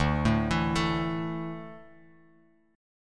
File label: D Suspended 2nd D down Bass Standard; arpeggiated